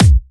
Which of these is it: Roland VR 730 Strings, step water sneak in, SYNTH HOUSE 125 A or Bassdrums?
Bassdrums